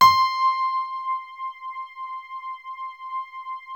BALLAD C5, E-PIANO; Music Store - Claudius Diemeter Schwerlos

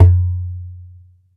Ashiko Super Gun; Multisamples; Digital Sound Factory Planet Earth

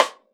RIM, Drums Acoustic; USB Soundscan vol.10; AKAI; RAW KIT 8

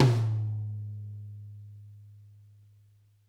tom, Interactive Drum Kits; Sonic Reality

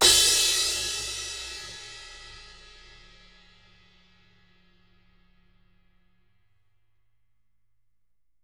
crash

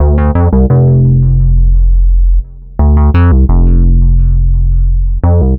Synth Bass

